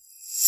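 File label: Boss DR-202 percussion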